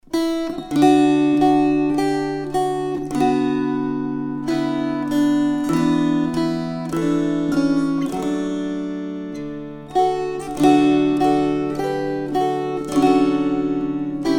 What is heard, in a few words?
String Choir